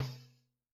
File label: Tamb HH, Yamaha DTXpress-II, Perc